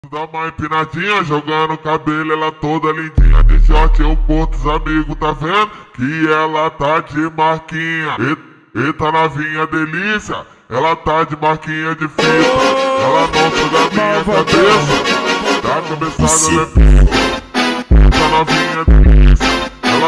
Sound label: perfectly slowed brazilian funk; troll face; tiktok